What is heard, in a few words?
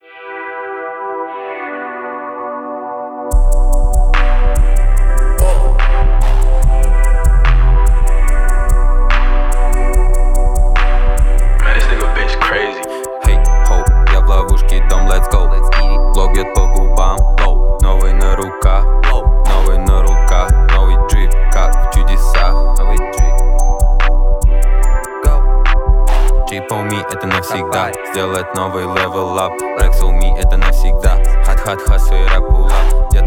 Drip